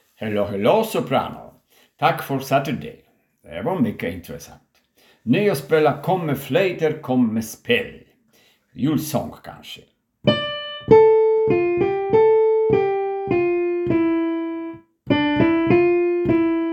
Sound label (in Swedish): sopran